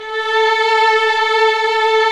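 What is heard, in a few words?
strings, 8pc, vibrato; String Master Series; Vlns 2-5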